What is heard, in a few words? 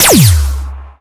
squad lasers; darwinia demo